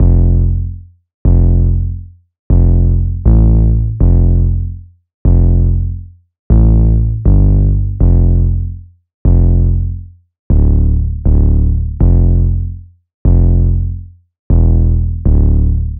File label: 808 loop 120 F; Slate Digital BASSHOLE Sample Pack